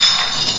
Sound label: chain